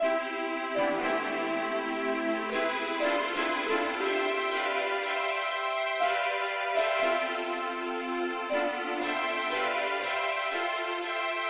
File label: popukelead key c